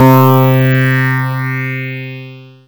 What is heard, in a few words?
Dance Floor Bass, Multisample Basses